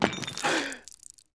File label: pick n click scene inn man at right table